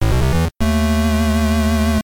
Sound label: Boss Taunt stinger; Soccerdie Cosmic Cup